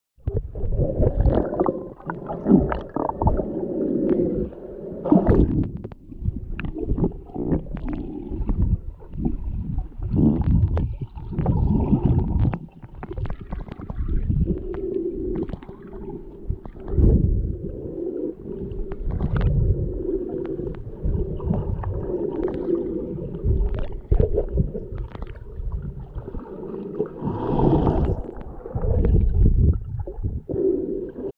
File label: Fishes In Croatia